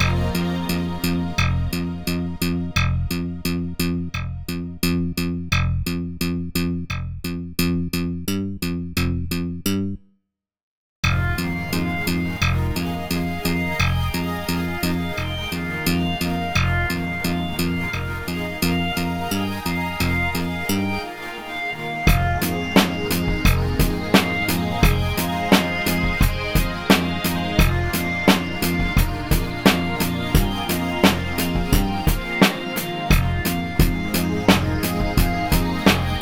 ambient loop

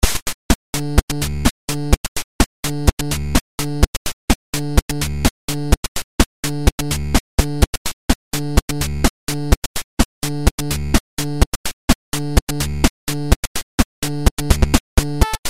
Ring me bell Electro PSG

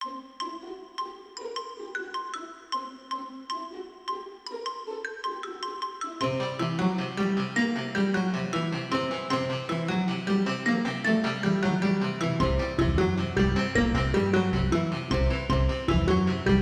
ruin island remix

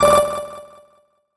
countdown

